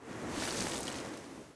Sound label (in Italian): onde del mare, natura